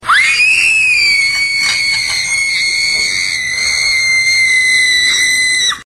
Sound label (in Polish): Krzyk